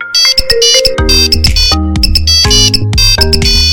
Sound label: Nokia Tune Ringtone